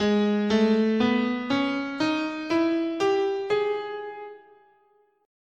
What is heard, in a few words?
Ab Neapolitan Minor